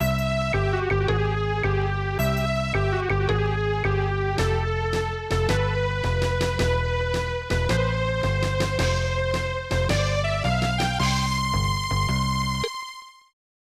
Lose; tetris battle gaiden snes

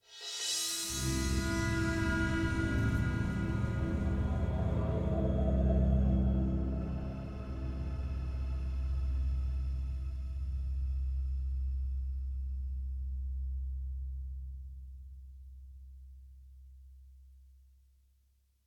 Music Pred Success; Harley Police

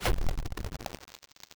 Fireball; Castle of No Escape 2 2016

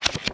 UI Upgrade Pay

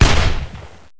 fire 762mm old

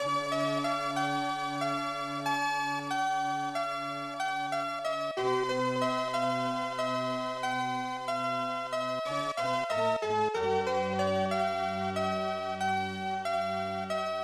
Death; song master 1992 snes

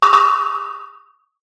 tick, confirm; Mystical Ninja Starring Goemon

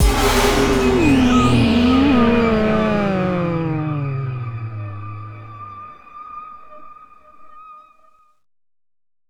Sting; Stronghold

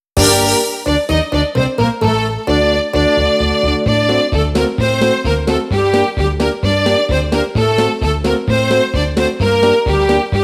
Folk Dance BGM Korobushka; Athletic Festival; tokimeki memorial girl s side

